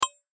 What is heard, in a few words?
chime mechanic, Chime Mechanical Short Reverb; Chime Mechanical Short Reverb Production Element Imaging Element Accent Transition; Multimedia; Chimes; Blastwave